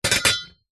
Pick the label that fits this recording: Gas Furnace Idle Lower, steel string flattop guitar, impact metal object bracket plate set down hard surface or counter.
impact metal object bracket plate set down hard surface